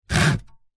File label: industrial hand saw sawing wood hollow single cut score